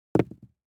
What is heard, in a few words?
DICE on Felt, Throw and Roll, Standard, 2 Two Dice; Samples; ArticulatedSounds; DICE Sfx Party (Extended)